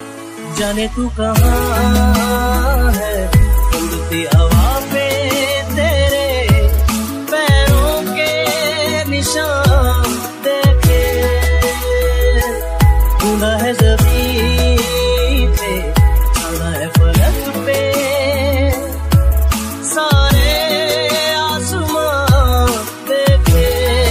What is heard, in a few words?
romantic music ringtone, bollywood